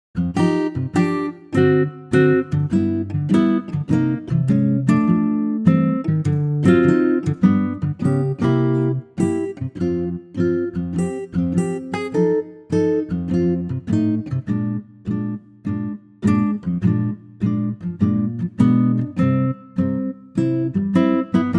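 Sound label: F Gb acoustic guitar; key - F to Gb - vocal range - C to Eb; Wonderful acoustic guitar track that's perfect for an intimate vocal, and it swings at a great tempo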